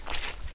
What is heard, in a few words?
barefoot mud; Apotheorasis